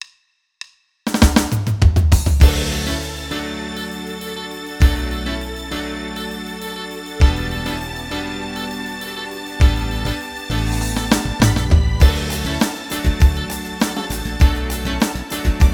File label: Philly Disco